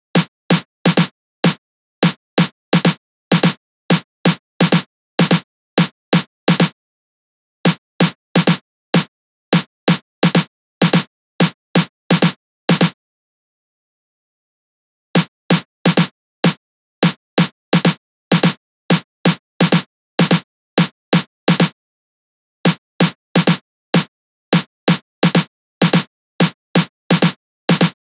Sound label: 128BPM Snare; Vengeance; Essential Clubsounds Vol.5; Mainstage Kit; Root Fmin; Drums & Perc